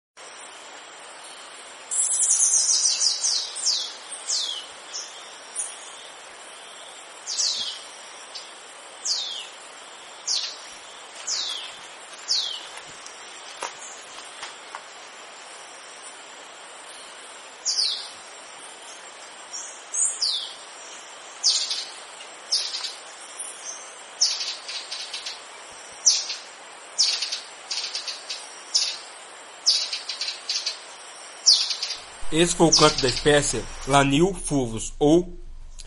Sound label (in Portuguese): canto da pipira parda